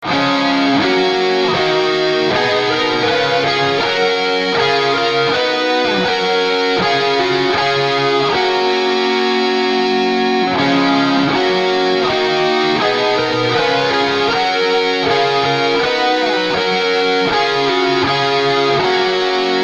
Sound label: Wilhelmus Dutch National Anthem; Will Schut